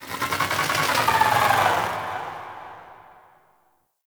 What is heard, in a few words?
Sentient Lair Exterior Creature